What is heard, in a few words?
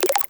Client Happy; Atelier